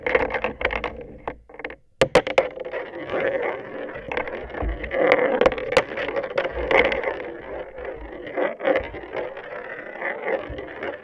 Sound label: In The Bath, Marbles, contact mic; musicradar; contact mic madness; Raw